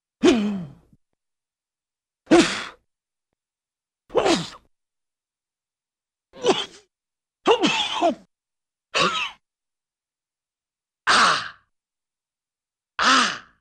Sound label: Various Grunts, Cartoon; Hollywood Edge; Cartoon Trax